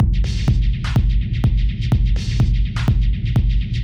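berlin berghain techno dirty loops A sharp minor 125; drum loops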